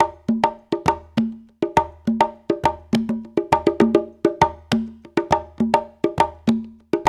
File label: 135, Latin Congas; Spectrasonics; Supreme Beats